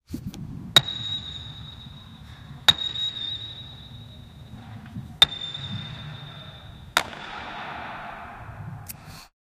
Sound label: basketball rim